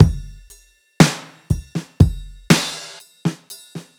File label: Ride Break